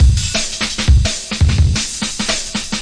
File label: 170 bpm big gated roller break